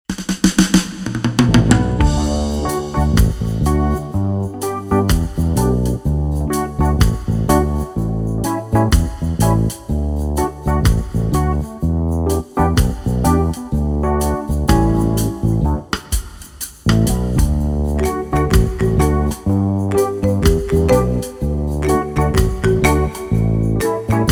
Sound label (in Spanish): Pista instrumental sin melodia